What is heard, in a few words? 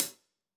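Hats Closed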